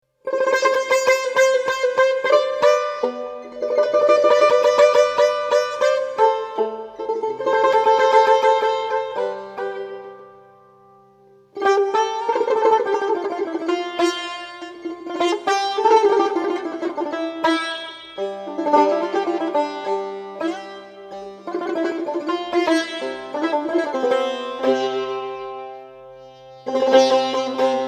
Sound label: Solo Tar (Daramade Mahoor)